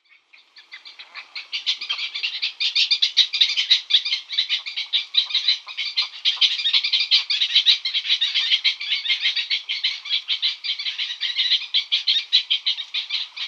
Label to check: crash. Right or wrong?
Wrong